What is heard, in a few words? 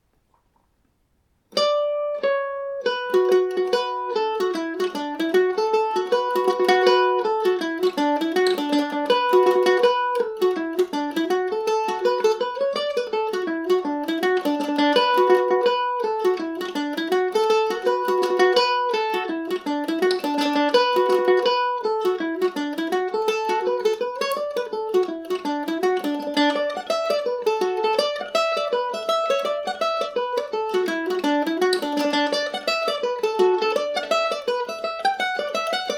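B minor, Irish mandolin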